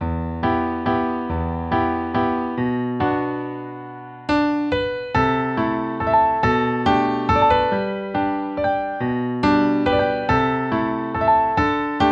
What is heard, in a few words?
accomp. w melody